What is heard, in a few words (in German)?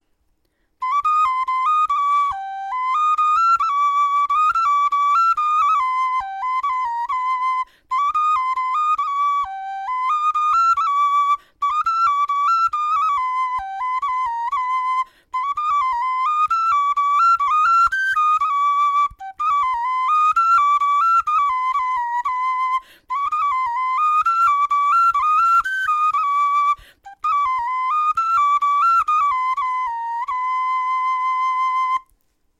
melodie, knochenfloeten